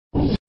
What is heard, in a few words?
overlay open; games; poker; American Poker The Legends II